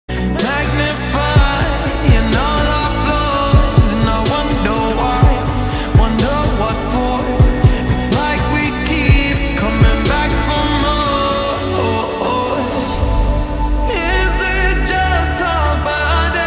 slowed ringtone, english ringtones

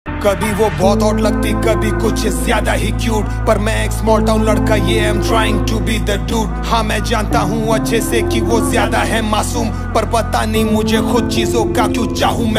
hindi rap ringtone

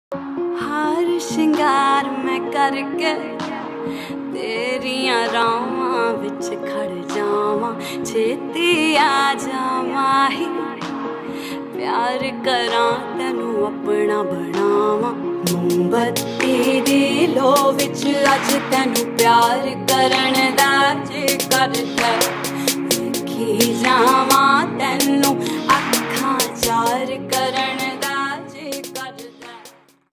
Punjabi ringtone

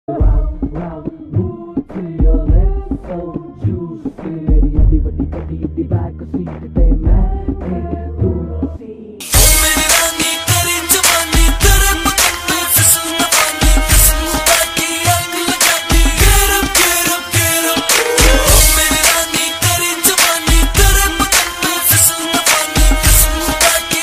slow reverb ringtone

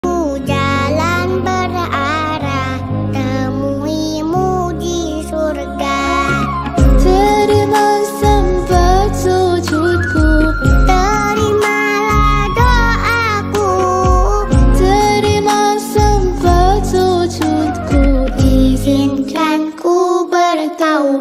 arabic ringtone

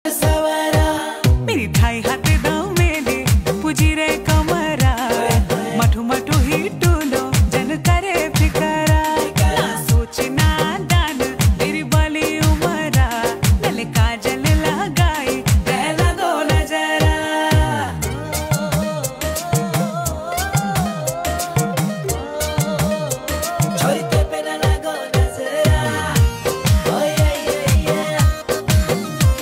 uttrakhandi ringtone

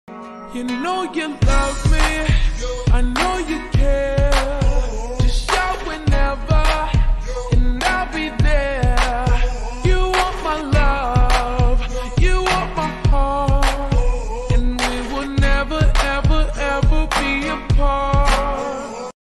slow up english ringtone

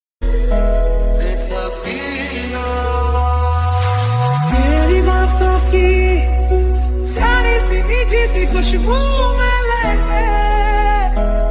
MASHUP hindi ringtone

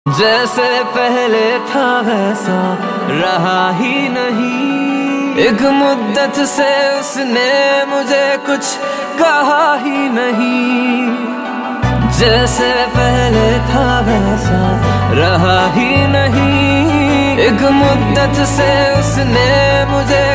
Song Ringtone

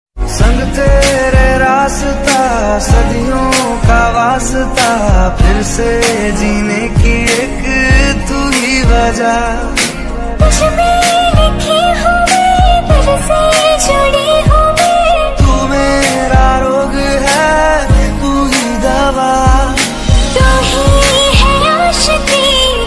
hindi ringtone

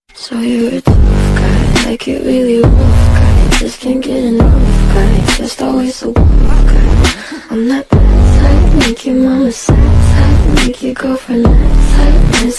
song mixed english ringtone

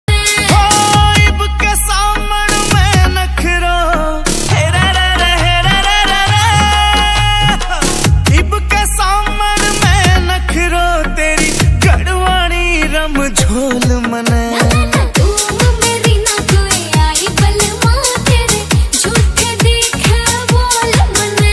haryanvi ringtone

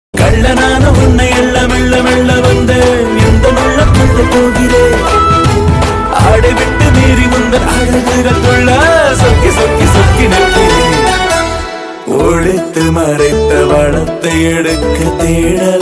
tamil ringtone

